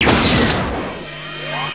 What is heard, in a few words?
railgun firing